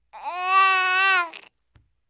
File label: baby